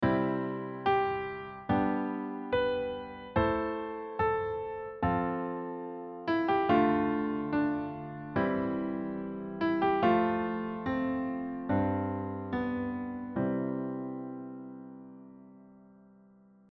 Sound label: diatonic chordtone